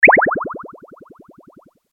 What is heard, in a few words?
Bubble; PaintMe